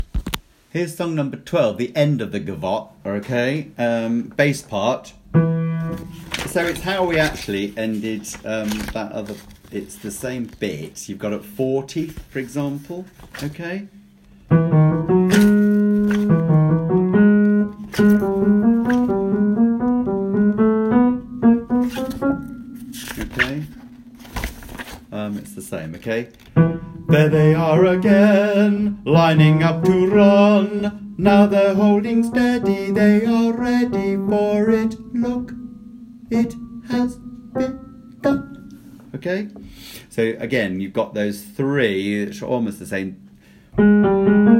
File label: Ending Bass part; No.12 Ascot